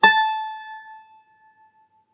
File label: string 1 fret 1